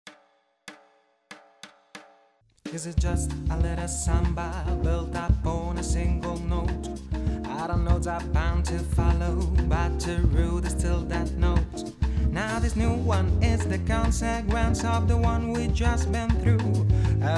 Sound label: SAMBA